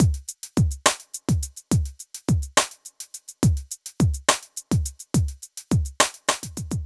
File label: 140 Triplets Drums